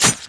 MOB BATTLE SHOTSWORD, Battle Mob Weapon

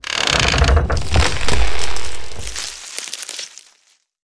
boss door die; 흑룡결 GATE

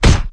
MOB BATTLE IRONLUMP, Battle Mob Weapon

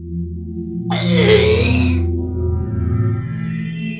Mob die; 백발마녀

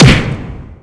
FIST; smashing; ONSLAUGHT

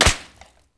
leather bash flesh

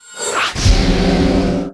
skill shot fire; SkillSound

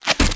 MOB BATTLE ARROW, Battle Mob Weapon

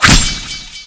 ONSLAUGHT KNIFE ATT; smashing

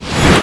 onslaught gather; smashing

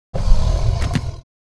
die; Monster; 독안표

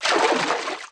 Deep Step Left, Movement

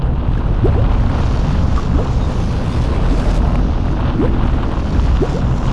lava; EnvSound

